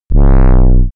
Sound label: break down short; energie